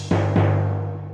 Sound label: Drums